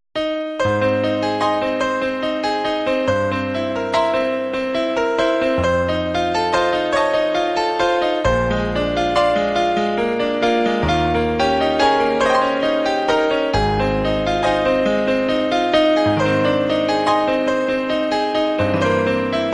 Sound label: nokia midi